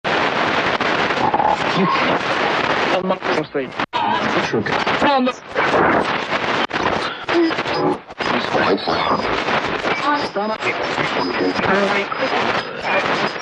Tuning Am Short Wave, static

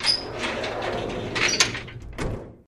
Sliding Metal Gate Combine Open; gate & big rolling

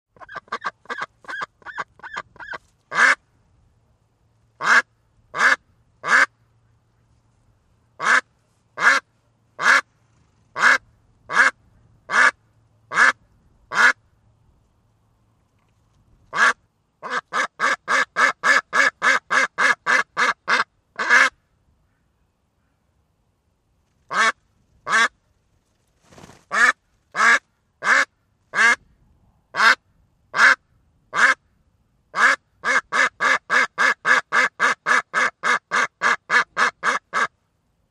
Ducks Quacks A Duck

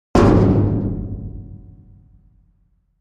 Dumpster Type 3 Hit with Sledge Hammer

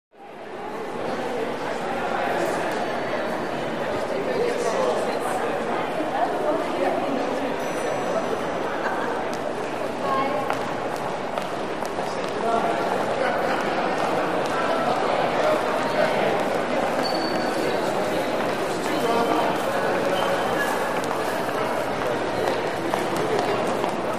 Huge Marble Int Lobby; Huge Marble Interior Lobby, Museu

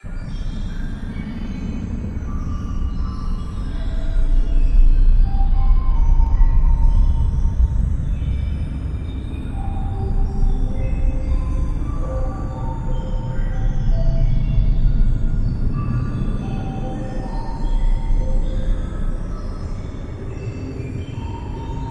Water Drops Digital Wat, granular

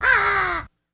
Crow Caw Downer

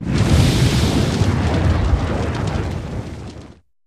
Dragon Fire Whooshes With Fire Crackle Element

